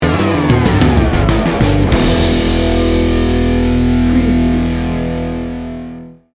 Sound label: rock fight sting bumper; Fast, powerful, Heavy Metal – Hard Rock. Driving distorted guitars fueled by driving bass